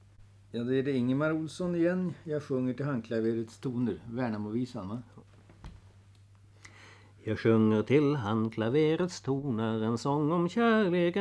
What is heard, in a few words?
Folk music of Småland; Jag sjunger till handklaverets toner